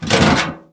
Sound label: zombie on car; fast zombie; Garry's Mod